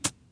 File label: golf club practice swing; ProStroke Golf World Tour 2007